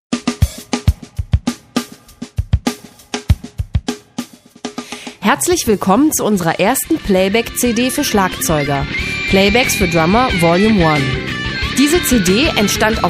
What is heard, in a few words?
Drums; Drums Vol.1; Trailer